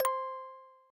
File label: Music Box, notifications; OnePlus 11